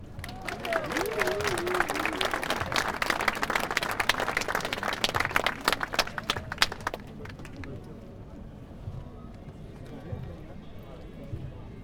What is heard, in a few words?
Applause